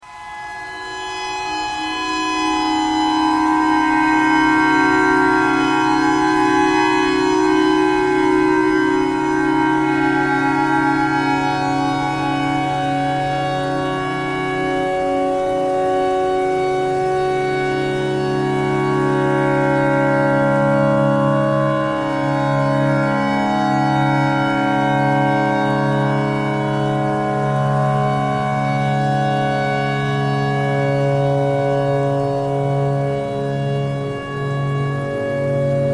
clarinet tornado siren pentatonic all

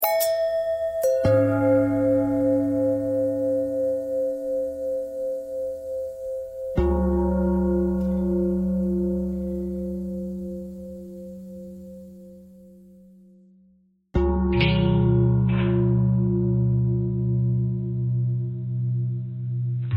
bell long bell thread simple, bells

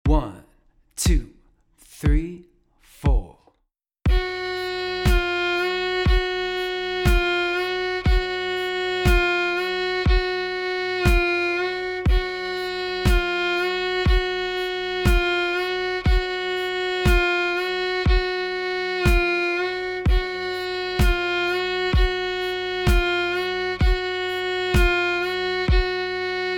Vibrato; fiddle

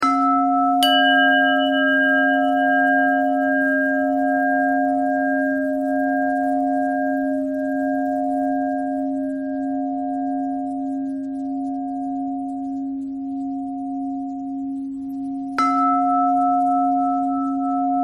bell bell thread simple, bells

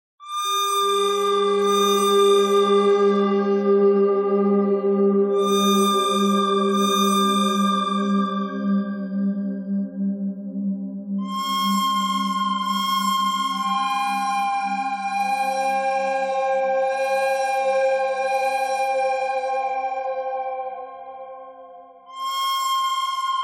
bird thread simple; noreverb

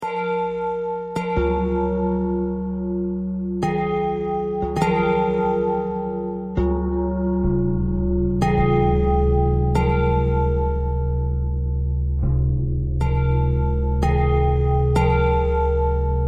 bell thread pentatonic

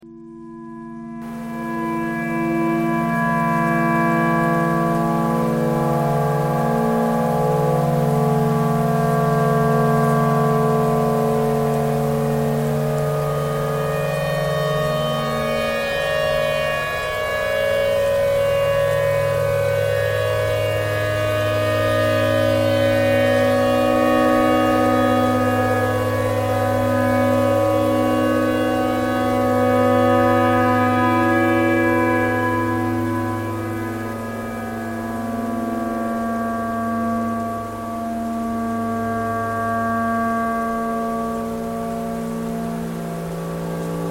tornado siren clarinet thread noise

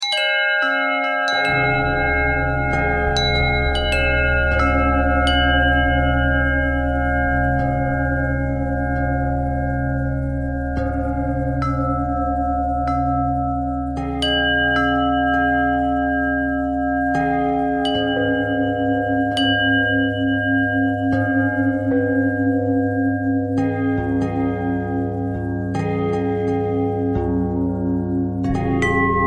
bell bell thread simple, bells

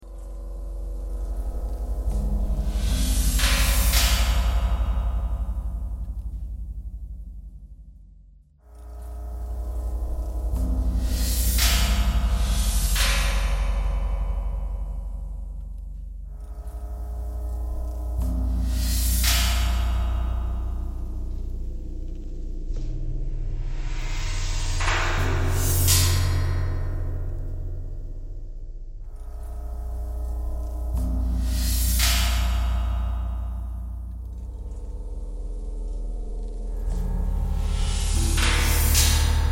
knocking thread noise

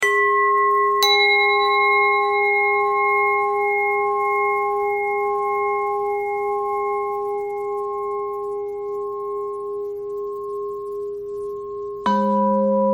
bell thread pentatonic

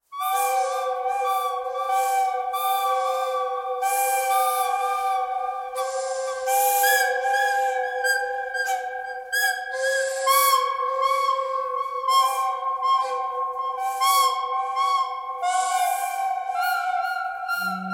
bird canyon thread pentatonic

